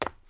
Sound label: FOOTSTEP CONCRETE